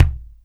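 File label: kick Lo Wood, Alesis D4; 300 Drum Machines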